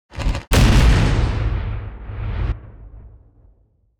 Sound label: Explosion Pre Wave